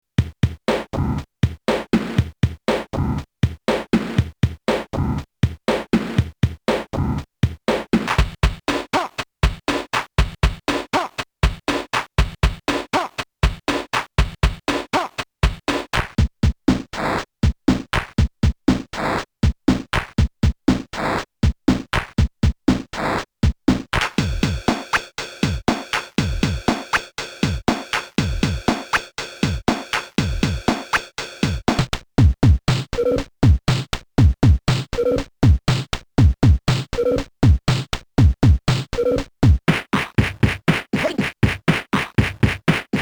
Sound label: Drumbank; Mega Synthesis